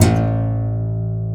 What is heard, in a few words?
ContraBass Balalaika A1; Planet Earth; Digital Sound Factory Planet Earth